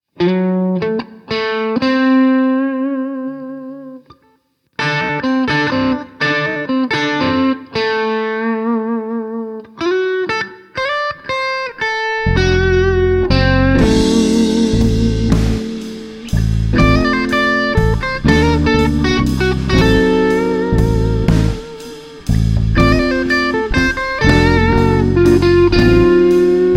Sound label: locrian bb7